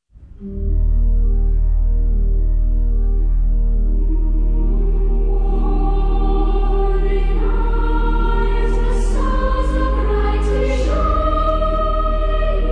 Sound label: Cathedral Choir; O Holy Night; arr. John Rutter